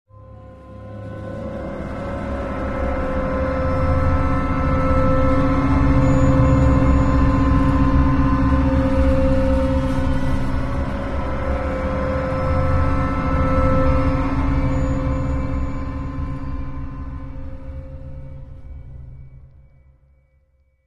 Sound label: Tense Full Loop